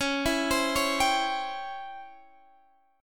C sharp Minor Major 7th Flat 5th C sharp Guitar Standard, chord; arpeggiated; x,16,14,17,14,15